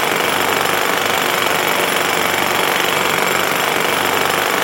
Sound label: Industrial Drill Loop Longer